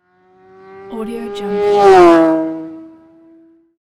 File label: racing motorcycle pass by